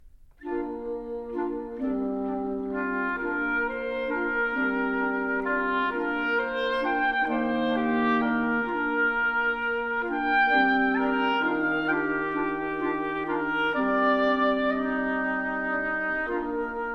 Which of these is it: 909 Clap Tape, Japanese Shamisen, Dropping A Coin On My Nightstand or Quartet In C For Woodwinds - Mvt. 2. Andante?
Quartet In C For Woodwinds - Mvt. 2. Andante